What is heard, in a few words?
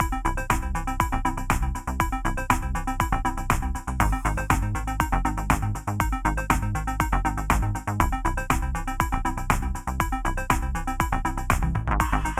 Arcade Puzzler, LoFi, chiptunes